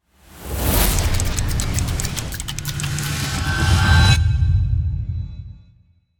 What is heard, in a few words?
Cinematic time freeze slow motion clock